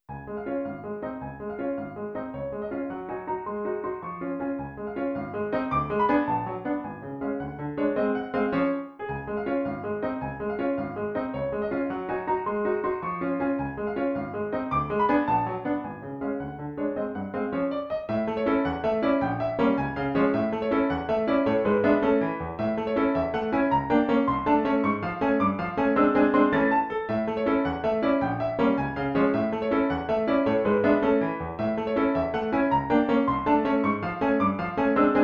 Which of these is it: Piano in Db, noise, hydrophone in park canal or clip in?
Piano in Db